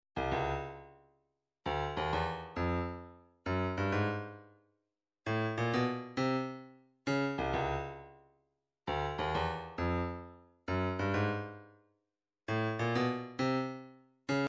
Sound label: cabasa, midi